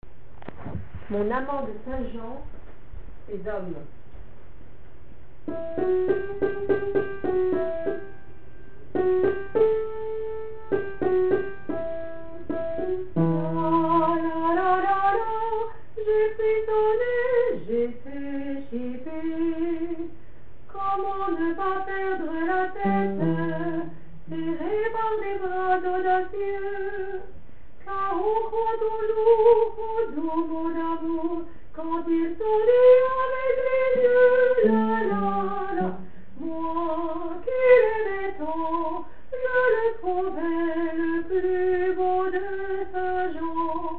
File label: Basse